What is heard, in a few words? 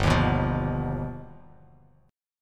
G Augmented 7th, Bass Standard; G+7 strummed; JGuitar